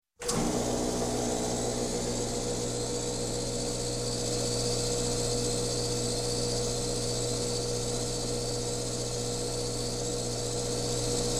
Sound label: Compressor Large Start, Run, Stop, Industry; Farm; Sound Ideas 2000 Series